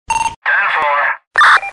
Police Radio; 10-4

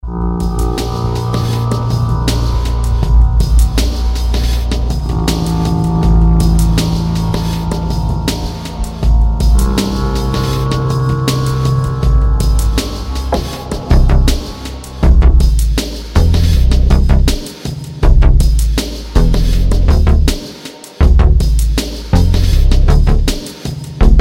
Groovy Ambient

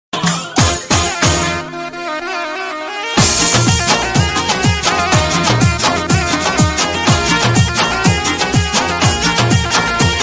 Arabian Music

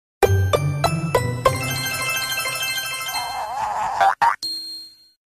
jingle; Namco

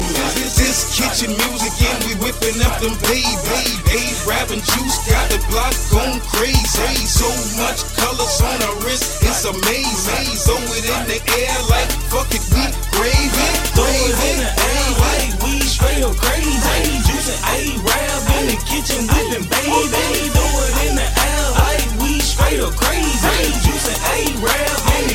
Kitchen Music

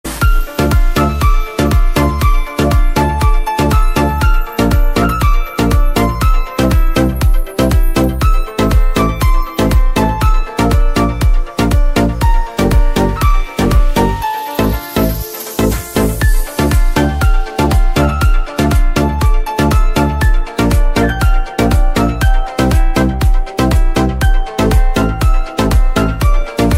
Piano

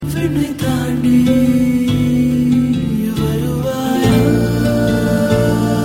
Tamil Sms

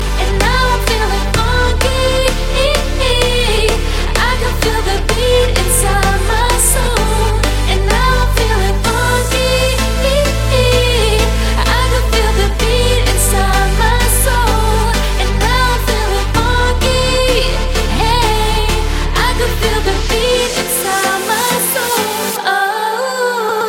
Nice Voice

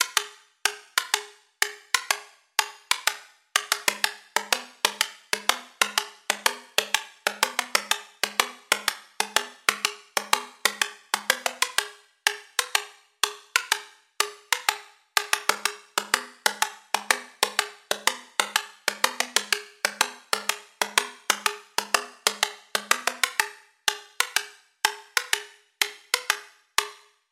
Rhythm of the horse

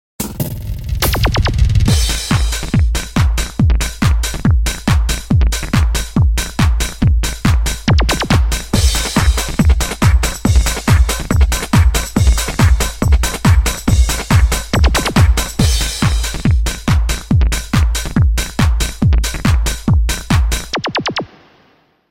Lazer Drum Beat

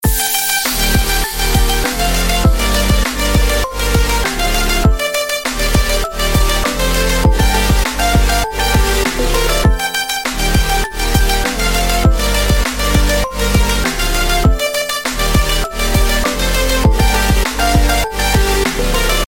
Popsynth Ringtone

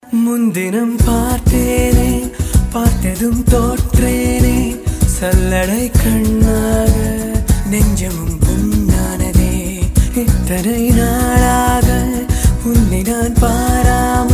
Male

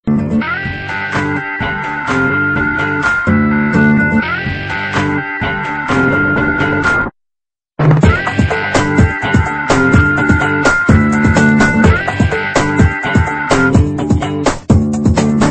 Bass Gitar Tune

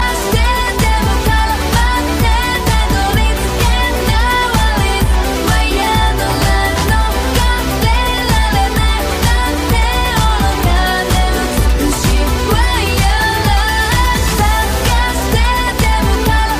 Electro

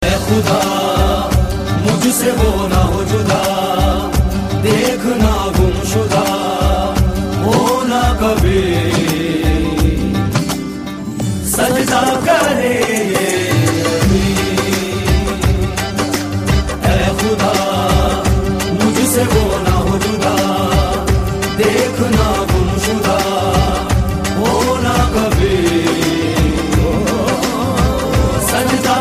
Sufi Song

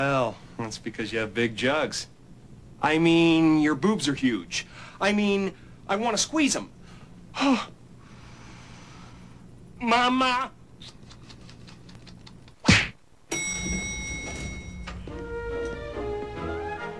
Liar Liar Elevator

